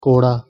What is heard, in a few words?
kora whip; korean; Punjabi